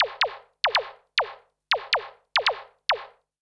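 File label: SNARE ELECTRO 140, Techno Drum; Techno2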